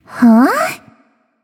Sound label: Confused; smite; scylla